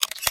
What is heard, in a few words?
shotgun reload; garrysmod